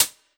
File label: Yamaha FS1r Drum Kit Closed Hi Hat